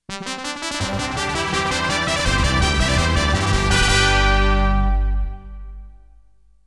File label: Bonus Stage Fanfare; Konami Game Music Collection; ARE YOU READY